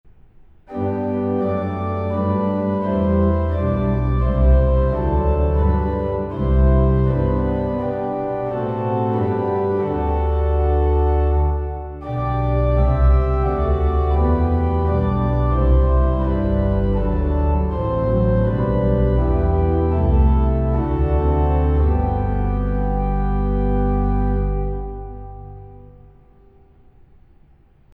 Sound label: hymns organ; melrose